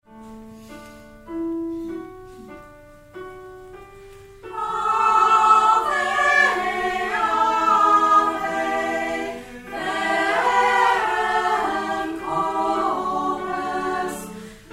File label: Choir at Day Therapy